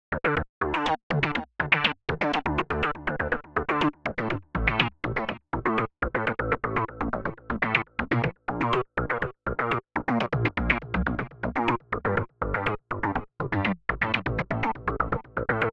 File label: Dholki Beat